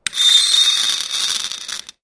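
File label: sounds of scratches on metal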